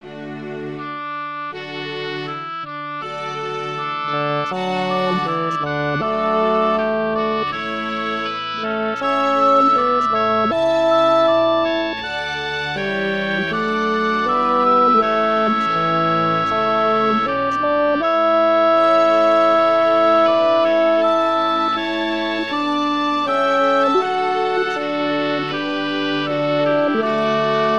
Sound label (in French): choeur tenor; haendel le messie choeur n 35a